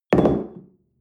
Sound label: espresso upset tablecloth medium